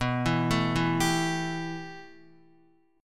B 7th Suspended 4th Sharp 5th B down Guitar; B7sus4#5; arpeggiated; JGuitar

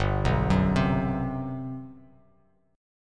G Major 11th; arpeggiated; JGuitar

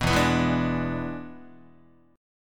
E 7th E down Guitar; strummed; chord; JGuitar

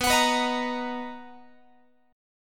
B Major 7th B down Guitar; strummed

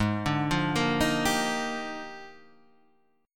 A sharp 13th

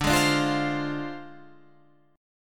D Minor 7th Flat 5th Guitar Standard; strummed; capo 9

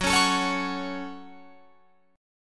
Gb Minor Gb Guitar Open F; strummed

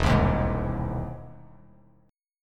E Augmented Major 7th E down Bass Standard; E+M7 strummed; JGuitar

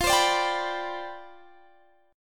D-Minor 13th, F

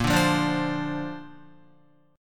Bb Major Add 9th, Bb, down, Guitar; strummed; JGuitar

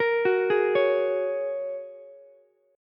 Bb Minor 7th Sharp 5th Ukulele; arpeggiated; chord shape

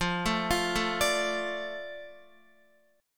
F 6th F down Guitar; F6 arpeggiated

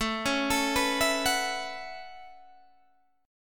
A 6th Add 9th A down Guitar DADGAD

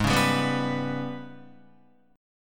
Ab Minor Major 7th Double Flat 5th, down, Guitar; AbmM7bb5; strummed

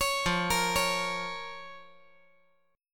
F Suspended 4th Sharp 5th F

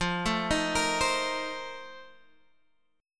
F 11th F, down, Guitar Open F; F11 arpeggiated

